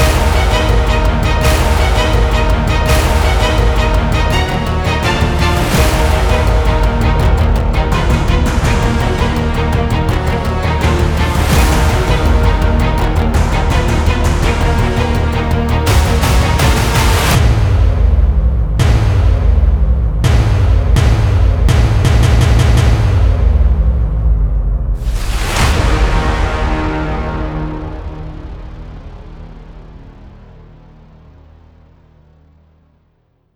tension; by burak sound bank; tension gerilim vol 23

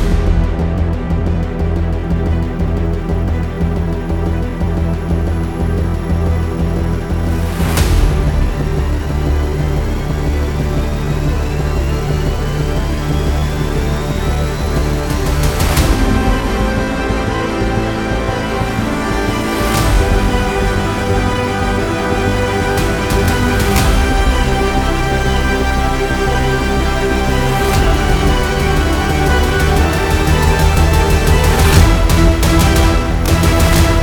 tension violin pad; By Burak Sound Bank

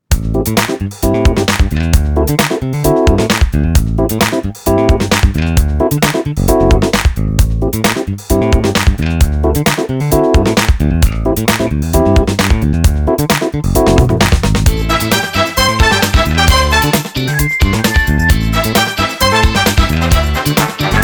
cheerful sax; by burak sound bank; cheerful vol 17

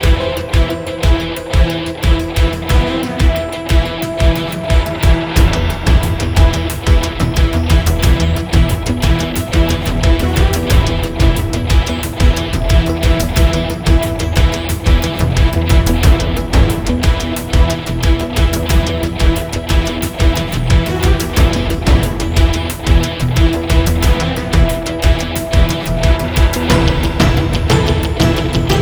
venue tension guitarcomposition; By Burak Sound Bank; tension gerilim vol 2

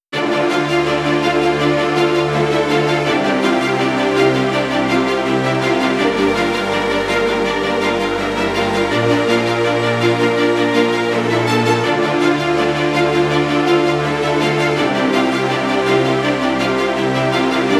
tension full violin; By Burak Sound Bank; horror excitement thriller song

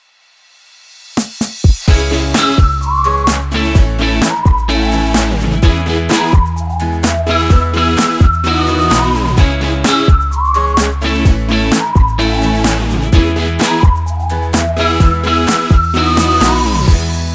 cheerful whistle; by burak sound bank; cheerful vol 5

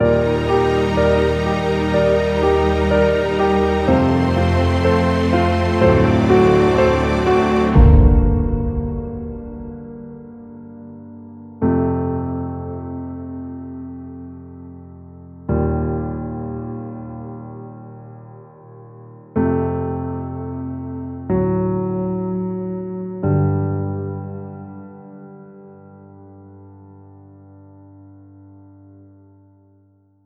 dramatic; By Burak Sound Bank

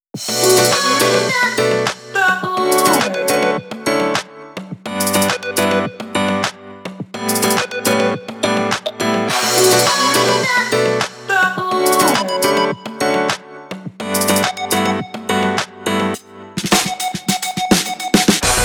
rnb hip-hop; By Burak Sound Bank; r b hip hop rap tema paketleri vol 1

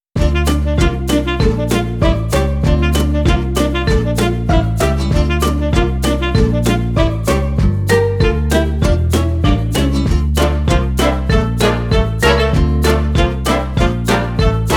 cheerful sax; by burak sound bank; cheerful vol 7

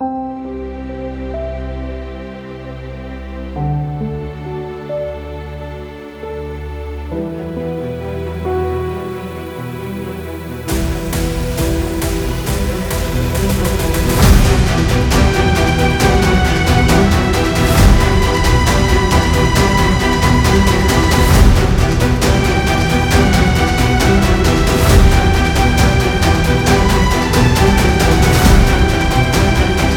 tension violin; By Burak Sound Bank

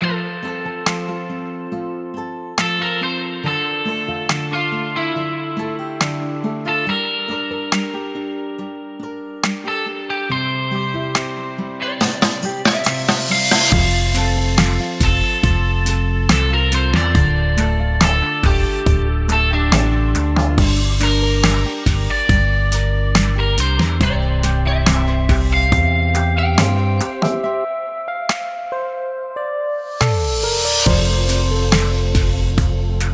love no strings; by burak sound bank; dramatic dram vol 19

